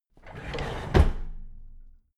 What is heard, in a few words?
drawer big squeak close heavy